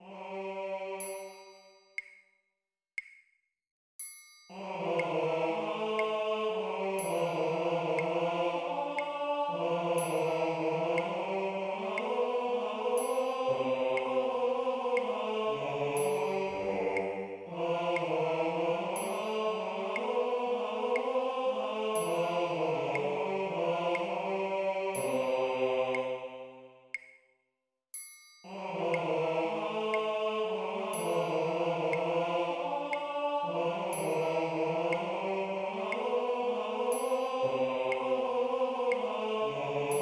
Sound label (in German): kantate Basso; BWV 0137